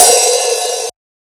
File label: Hats, Alesis Performance-Pad